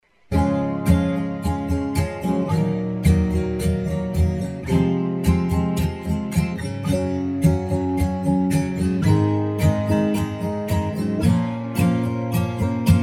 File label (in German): Db Cis Dur Akkorde